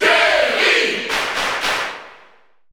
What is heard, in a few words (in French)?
Cheer French; Terry; SSBU